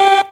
Synths G; Vengeance Samples

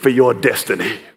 Vocal Phrases; Vengeance Samples; Vocals

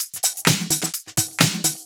Houseloops 128BPM; Vengeance Samples

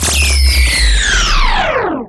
VFX2 Sub

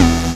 Synth Shots; Vengeance Samples

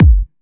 Kicks; Vengeance Samples

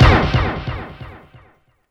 Impacts

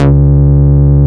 Vengeance Ultimate Bass C#, Root C#

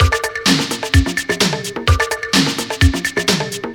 Housebeats, 128BPM; Vengeance Samples; VEH2 Loops

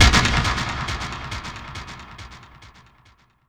VFX Atmos

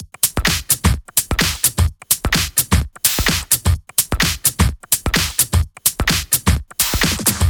128BPM Kit; Vengeance Samples; Pitchford Kit

